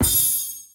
collect; Back to 70s; wazdan

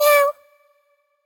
win; Colin The Cat; wazdan; ultrafast

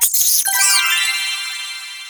gambling win; Colin The Cat; wazdan

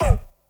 reels stop; DinoReels81WD; wazdan

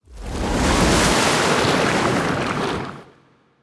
draw water; gamble; DoubleTigersWD; wazdan

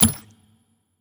summary panel mouseclick; Butterfly Lovers; wazdan

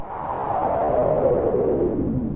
wind; Caribbean Beach Poker; wazdan

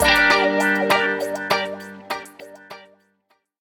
gambling enter; Colin The Cat; wazdan

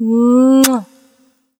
kiss; Beauty Fruity; wazdan

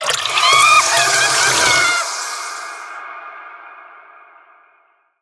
gambler win; DraculasCastleWD; wazdan; gambling

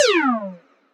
reels start; Back to 70s; wazdan

